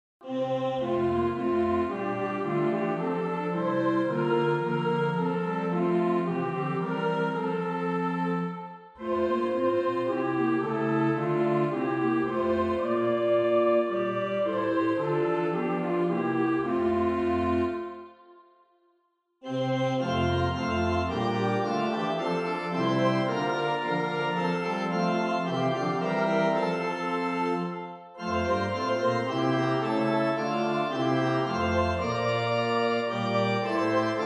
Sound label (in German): orgel